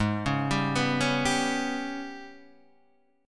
G sharp Minor Major 7th Double Flat 5th, Guitar DADGAD